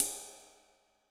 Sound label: Deep Cymbal; Yamaha DTXpress-II